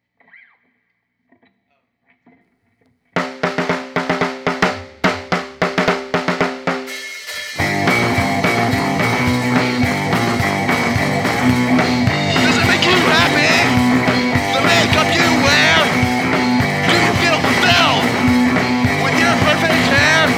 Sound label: 3 string blitz